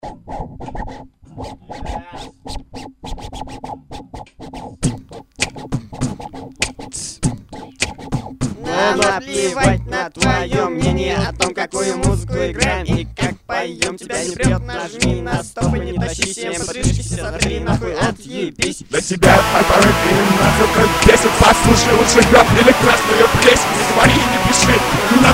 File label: hip-hop version